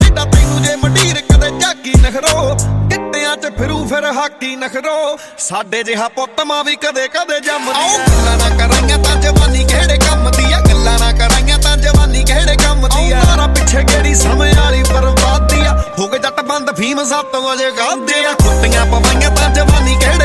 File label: Punjabi ringtone